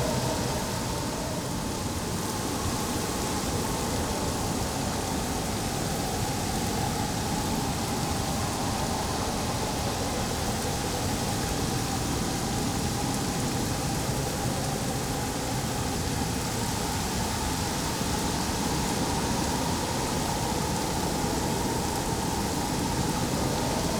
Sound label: ambience arctic beach day breeze rainy; Rust